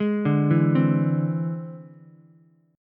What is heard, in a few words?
E Augmented 7th E down Ukulele; E+7 arpeggiated; JGuitar